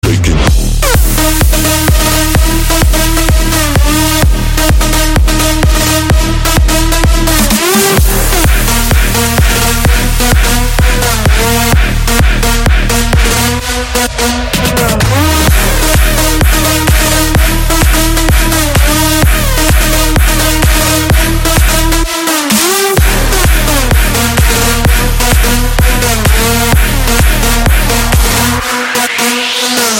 Edm drop

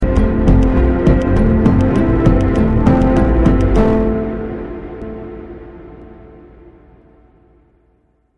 Calm Notification

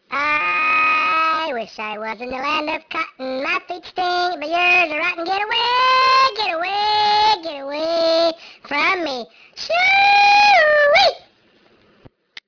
Chipmunk Dixieland

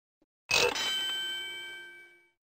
1up; Crash Bandicoot

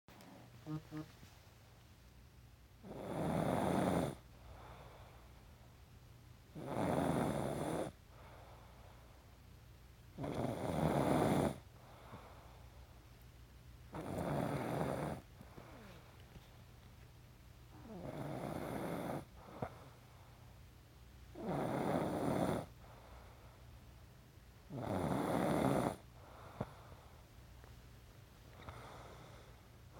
Snoring

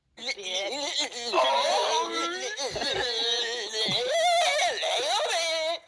Possessed screaming

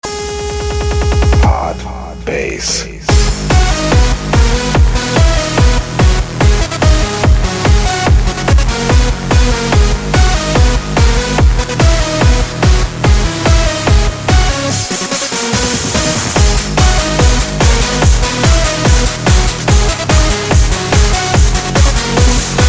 Hard Bass

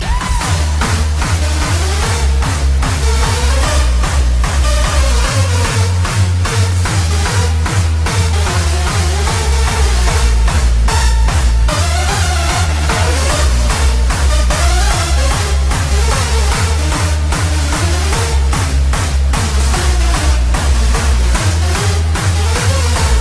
Hardstyle; Zelda

